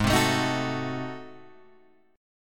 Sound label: Ab 7th Flat 9th Guitar Open G, chord